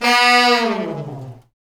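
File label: Brass Fat Falls; Roland L-CD702; Fat Section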